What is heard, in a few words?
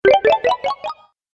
Restore Health, Video Game Sound Effects